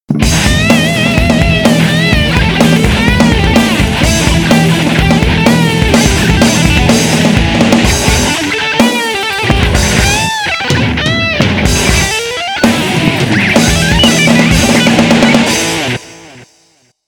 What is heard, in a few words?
hard rock short BGM; Gitadora OP style